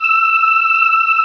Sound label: Choir Aahs E6; Star Trek JR Audio